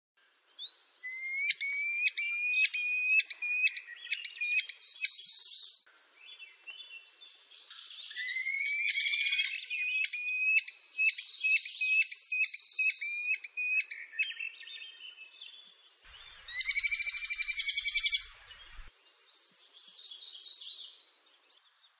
Canto do Esmerilhão

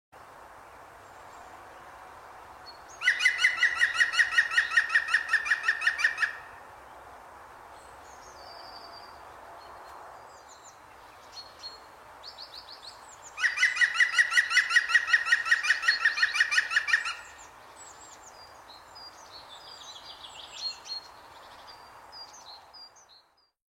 canto do peto verde

